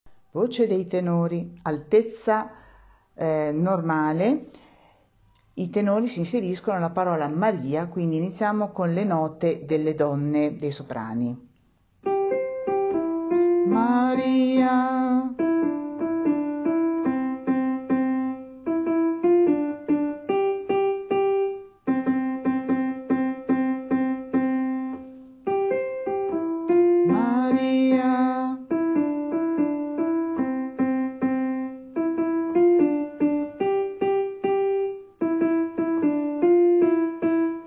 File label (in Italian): tenori; Mama Yetu Maria